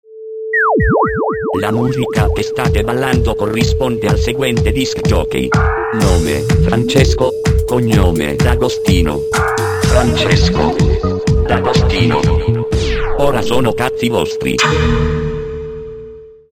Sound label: jingle